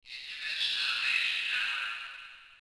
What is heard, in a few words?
subway